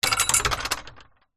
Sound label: household gate wooden close metal latch